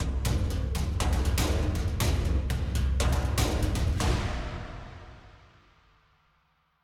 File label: String; Assassin's Creed Unity Dead Kings